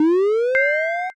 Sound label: cast start